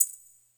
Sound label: maracas